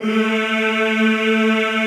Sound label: A2, Classical Choir; Best Service; Extended Classical Choir